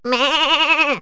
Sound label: mon sheep; animals; Wired for Sound Pro CD; Aristosoft, Inc.